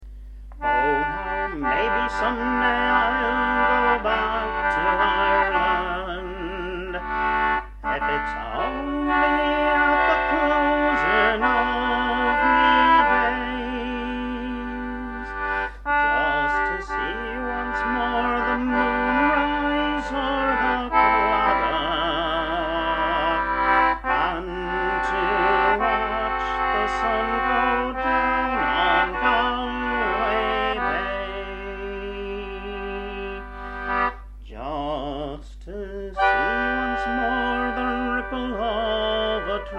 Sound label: Concertina